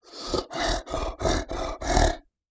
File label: Animal Mammal Carnivore Grizzly Bear Breath; animals; AdobeCC